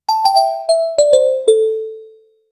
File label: level up rewarding simple, positive